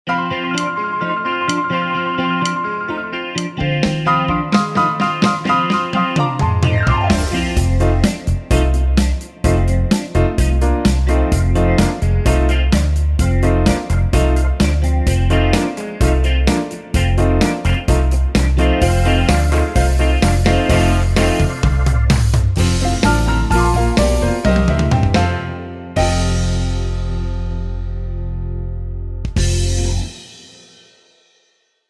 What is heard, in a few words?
rock